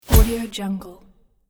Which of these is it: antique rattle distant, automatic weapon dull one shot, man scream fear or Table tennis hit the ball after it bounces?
automatic weapon dull one shot